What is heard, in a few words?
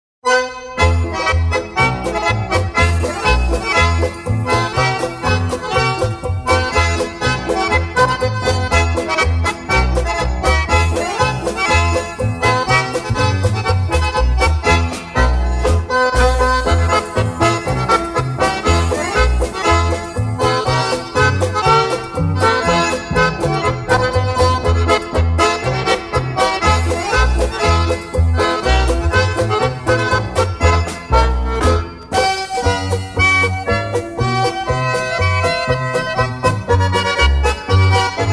Mark Polka, accordions